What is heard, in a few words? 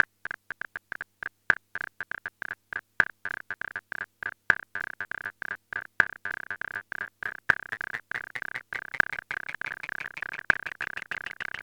Erica Synths Black Hole DSP2 Synth Dual Delay long feedback